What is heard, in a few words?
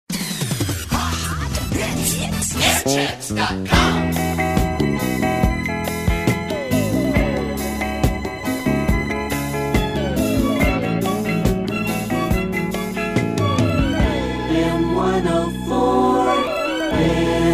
jingles; WFMP